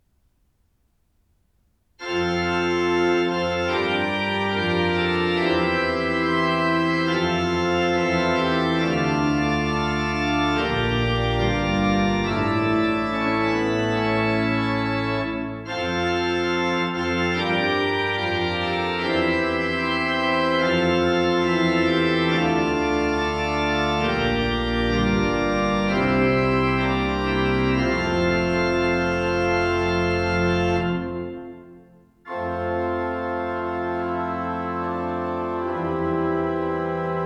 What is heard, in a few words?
Fanfare; Min fanfare på orgelet i Gand kirke.; Grönlund Orgelbyggeri Gand Kirke; ZOOM H4n PRO